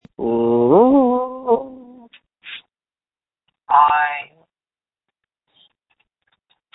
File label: screams